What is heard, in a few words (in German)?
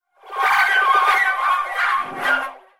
triller klein, floete